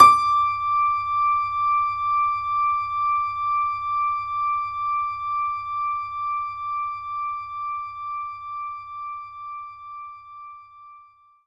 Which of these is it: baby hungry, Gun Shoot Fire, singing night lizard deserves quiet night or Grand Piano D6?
Grand Piano D6